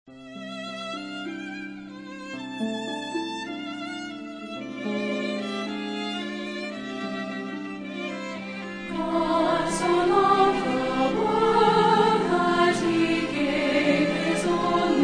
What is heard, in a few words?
Singers With Orchestra